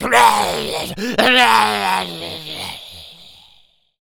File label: Male Berserk, Zombies; zps; Male1